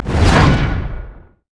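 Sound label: armadillo defensive ball curl; rammus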